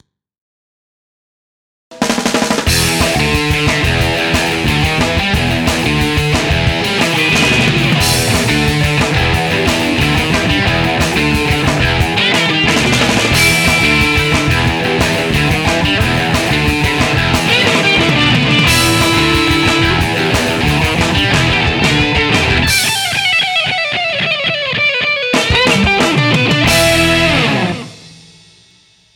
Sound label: hardrock